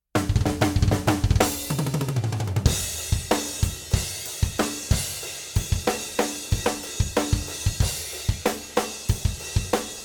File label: Drum Freestyle